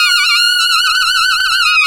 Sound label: Sax Effect; Sonic Foundry (Sony Creative Software); Jazz Solos and Sections; Effected Sax